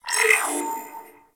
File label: RoloDex Select, Corpus Ship